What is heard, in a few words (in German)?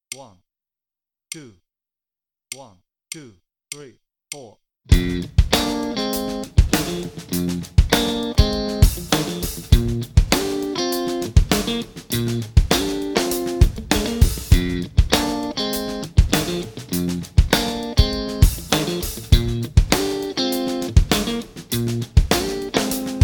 Play-along, Bass Unlimited